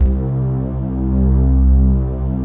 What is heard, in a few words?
M MUTE PADS; Pro-Rec - Synthsonic